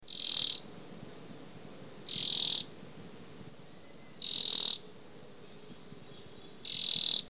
Crescent chested Warbler

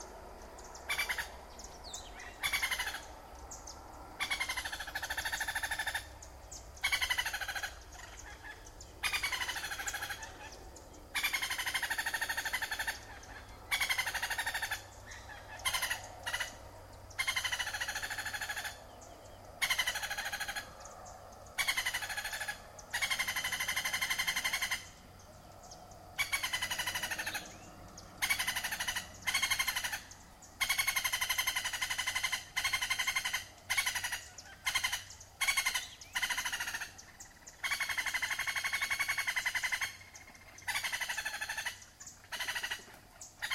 West Indian Woodpecker

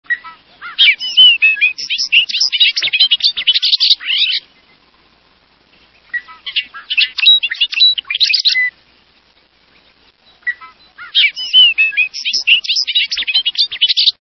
Bobolink

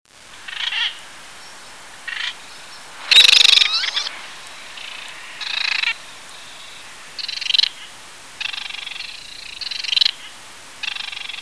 Black Noddy, songs

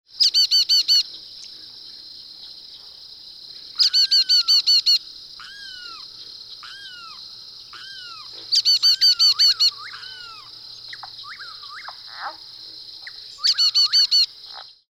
Brown headed Nuthatch

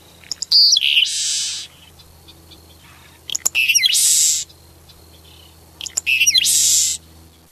Seaside Sparrow

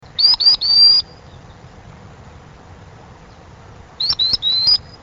Common Sandpiper, songs

